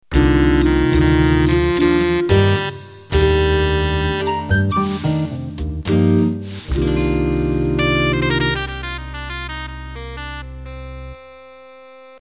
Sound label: Slow Jazz End